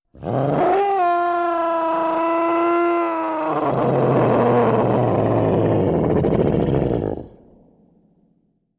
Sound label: Enraged Dinosaur; animals wild dinosaurs